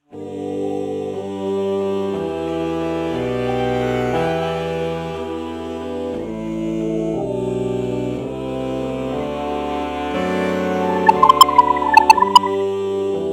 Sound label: alto saxophone oh morph